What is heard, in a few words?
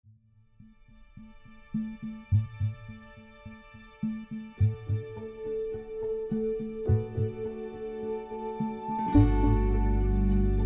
Dramedy Bitter Sweet Upbeat